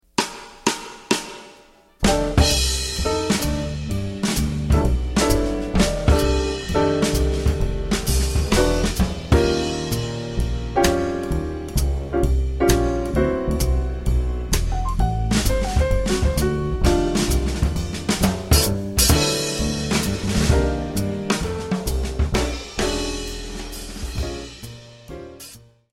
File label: no melody